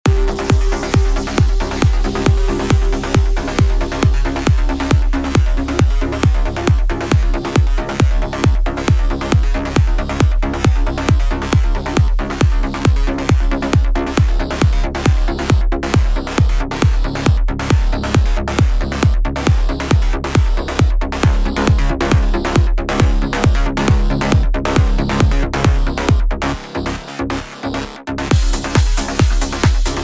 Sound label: bass; luscious bass soundset